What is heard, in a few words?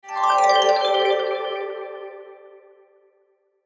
Logo Piano Twinkle Flange Delay High; Sonic Logo; Blastwave